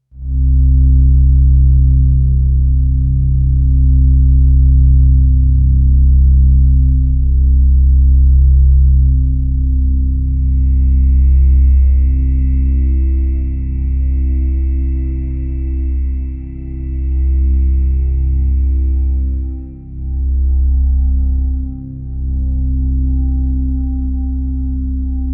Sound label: a low synthesizer drone